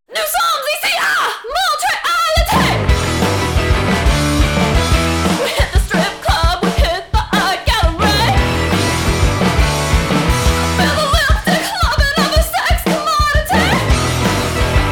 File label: distant City